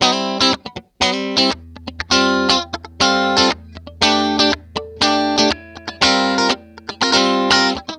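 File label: GIT-CHRDLICK, guitar chord lick, Disco; Best Service ProSamples vol.48 - Disco Fever; AKAI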